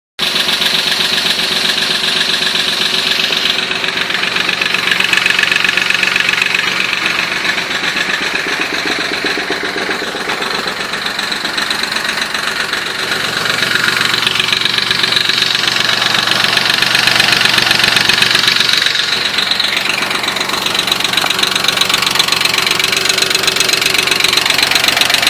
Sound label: 99 boxster ticking tap noise boxster noise; 986